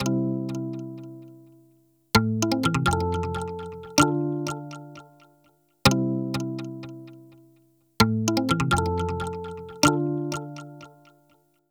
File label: PERC, Hip-Hop & R&B; USB Soundscan vol.47; AKAI; HIHHOP